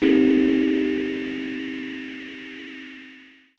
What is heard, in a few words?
SYNTH Peace Chordz; Gasious Giantz